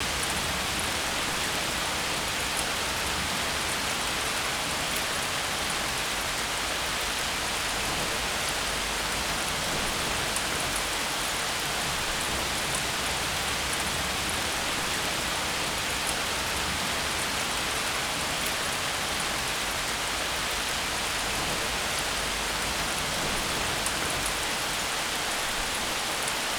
RAIN MID OUT; YBR125

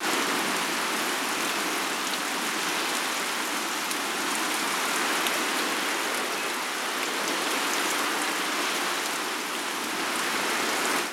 SKID FROZEN; SIMUZENSOUND

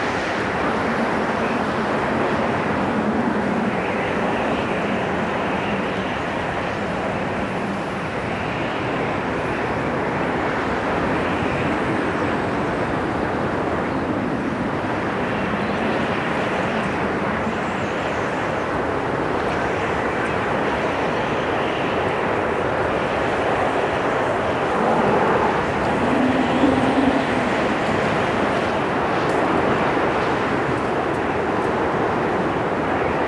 WIND HIGH; SIMUZENSOUND; BOUNCE

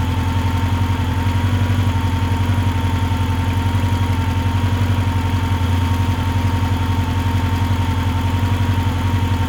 MOTO IDLE; SIMUZENSOUND

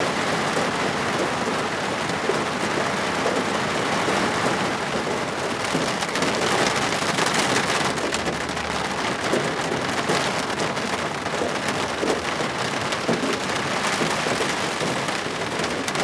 HAIL HIGH OUT; YBR125

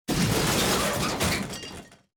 collision crystal; YBR125

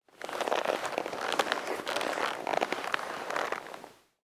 MOVEMENT PEOPLE; SIMUZENSOUND

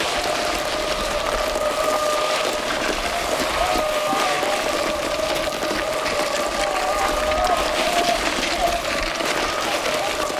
SKID BAD ASPHALT; SIMUZENSOUND

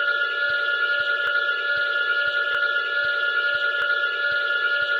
brake retarder; MaqSim

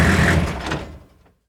MOTOR OFF 4X4; SIMUZENSOUND

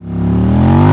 REVS; YBR125

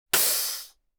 AIRBRAKE; SIMUZENSOUND

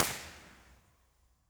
City Streets, Hybrid Reverb; iZotope Hybrid Reverb

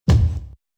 COLLISION BASS LITE; SIMUZENSOUND

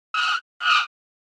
COLLISION WHEEL CONCRETE, Wood Skid Dry; SIMUZENSOUND

